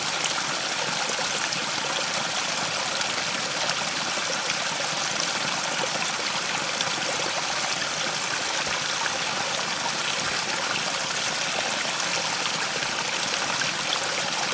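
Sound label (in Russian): быстрый вечерний ручеёк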